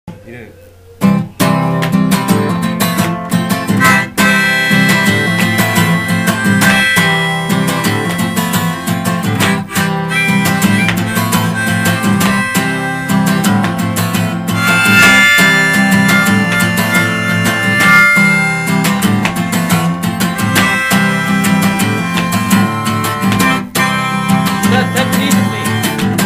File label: harmonica